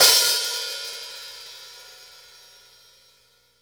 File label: OPEN WIDER, Drumworks; Sampleheads; New York City Drumworks VOL-1